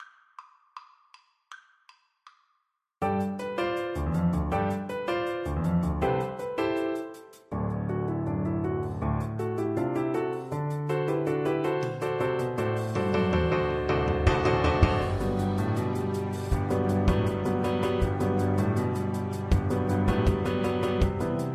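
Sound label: VLC karaoke, Cello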